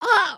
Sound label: reach, emotes